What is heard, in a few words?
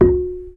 GAMBANG F4; Spectrasonics; Heart of Asia